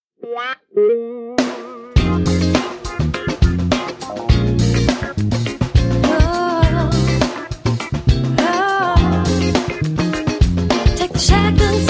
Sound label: gospel celebration choir